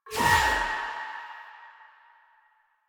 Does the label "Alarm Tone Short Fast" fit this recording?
No